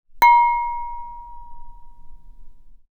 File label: glass hit